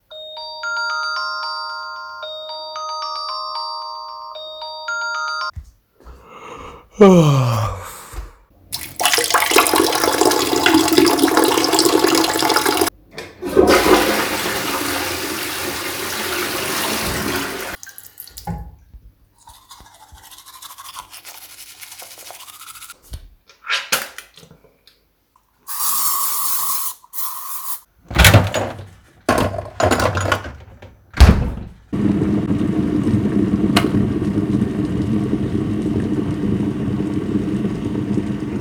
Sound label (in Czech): Ruchy